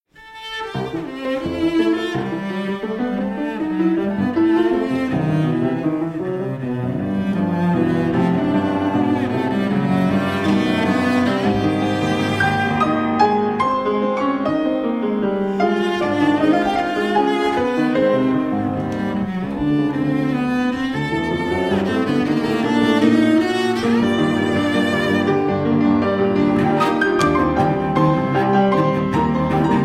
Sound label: Sonate